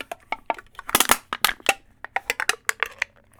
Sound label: Small Can - Using My Big Strongs Hands to Crush Tiny Can; Micro Pack